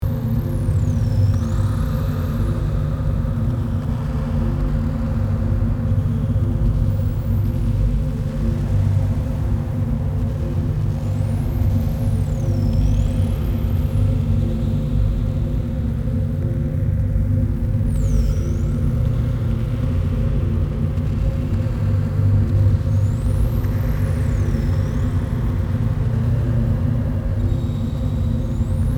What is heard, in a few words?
Abandoned spacecraft ambience; Sci-Fi Ambience; futuristic world